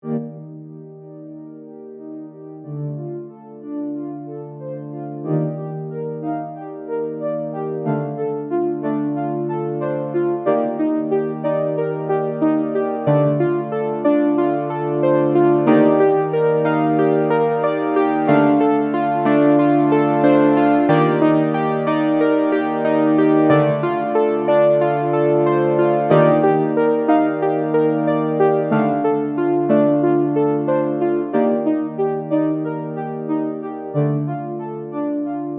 plucked synth; bluewave

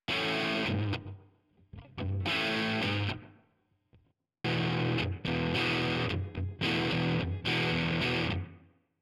electric guitar; colour delay by HOFA Plugins

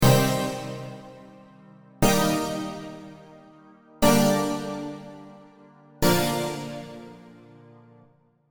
vital presets; chiqui audio; vital presets pack

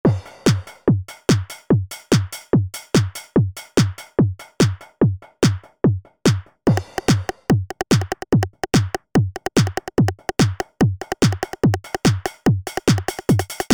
d909 kit; superwave

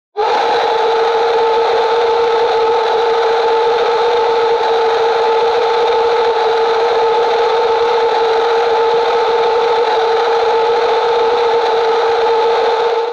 scream male; happy horro-ween; music and sound fx master collection; nova sound

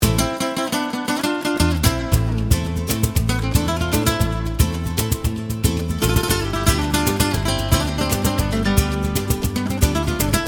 nylon flamenco rhythms; big fish audio

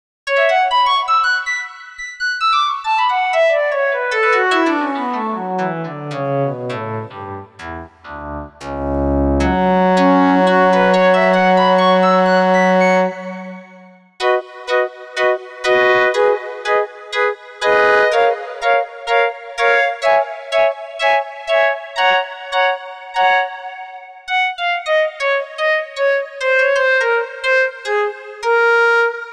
choir lead; piper; the digital life

